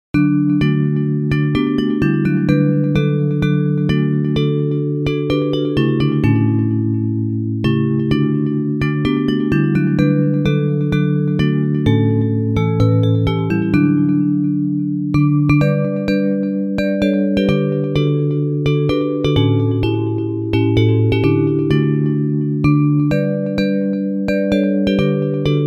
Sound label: Bells; Hymns